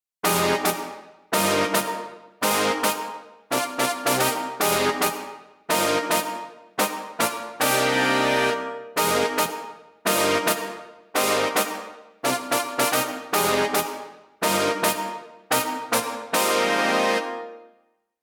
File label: Power Brass; VIVO H10